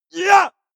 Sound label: male combat attack short